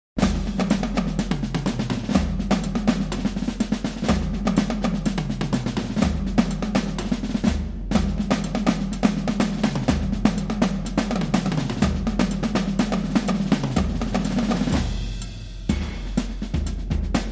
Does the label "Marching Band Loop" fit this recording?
Yes